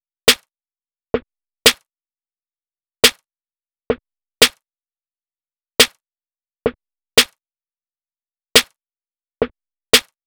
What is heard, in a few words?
Drum Loop, 87 BPM Snare; Loop Stems; Cymatics; Vibes II Drum Loop Collection; No Reason Drum Loop